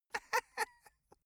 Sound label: dwarf laugh sneaky